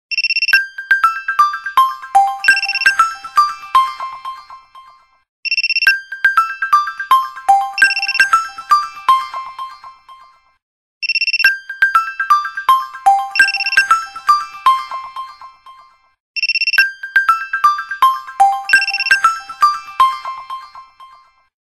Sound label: Alarm, Nokia Lumia 1520; Lumia 1520 South Africa